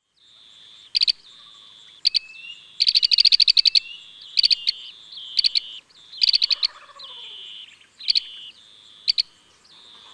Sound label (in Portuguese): abelharuco verde Merops orientalis